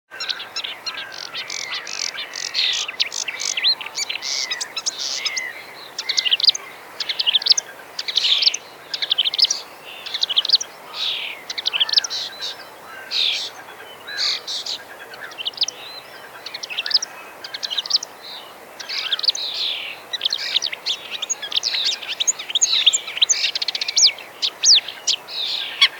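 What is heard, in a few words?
marsh warbler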